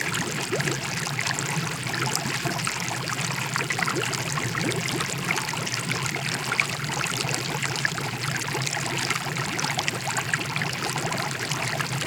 Water, Bubbles, Cauldron, High; Poetry Project

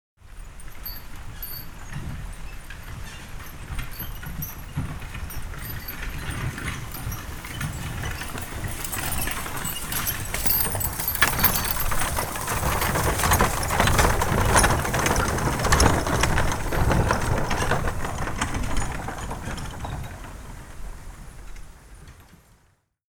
Horse Drawn Wagon By; Electa Quinney

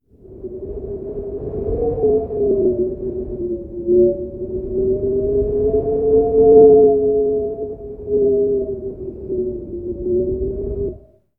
Wind, Scary Howl, Low Whistle

